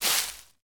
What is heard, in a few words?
Leaf litter step; Ściółka; Umieszczono blok; Gra gdy umieszczono blok